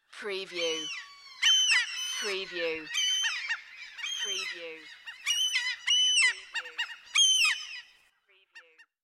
Many Seagulls Calling and Cackling

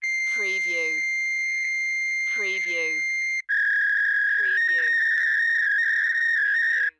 Fax Machine Connect

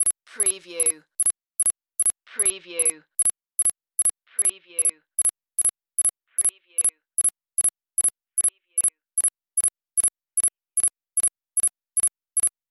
Computer Beeps Loop

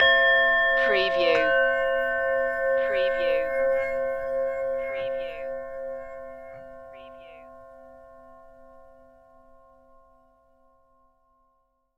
tibetan bowls meditation stinger

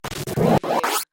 Scifi Production Element - Cool Transition

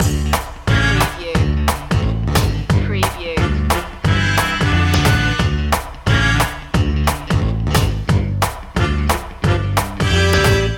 mambo loop

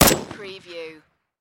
Rifle Dragunov SVD

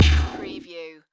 Hi-tech Panel Servo Button

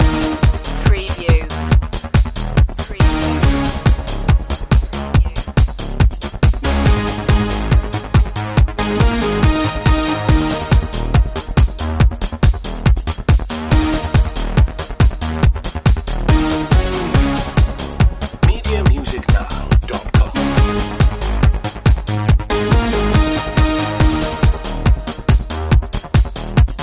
mystic loop

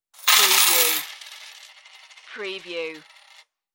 Coin Dmp Tbl

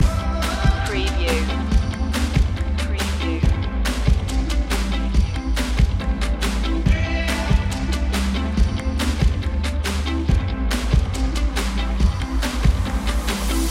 creative days loop

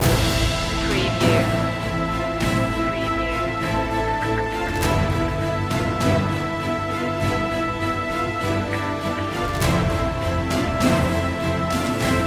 no choir

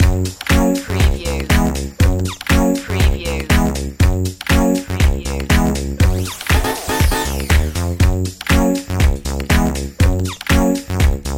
retro video game tomorrowland loop

